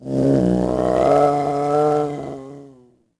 cry, creatures; kaadu; Star Wars Obi-Wan; XBOX

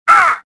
choke; Star Wars Jedi Knight II Jedi Outcast; XBOX

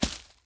footstep walk vegetation

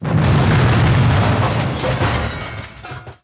explo rumbly debris; impacts explos

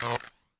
bad zap

